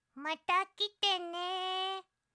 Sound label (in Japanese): Windowsの終了